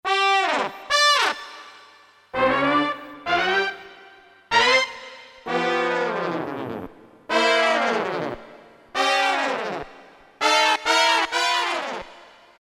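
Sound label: Brass Hit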